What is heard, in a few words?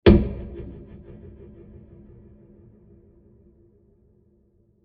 Deep Diffuse Impact; Blasts; Impacts; CraftedSignals